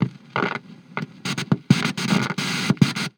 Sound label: Squelch; Inmarsat